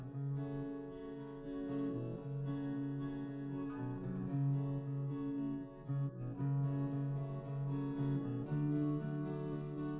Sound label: drums live rec 250ms 1m; SiSEC11; underdetermined